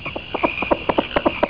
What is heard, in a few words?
HOP, frog; bud frog